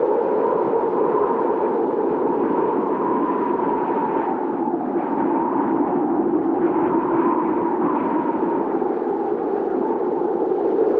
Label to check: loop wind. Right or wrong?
Right